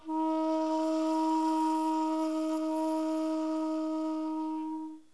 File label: Tsu No Meri, shakuhachi; flute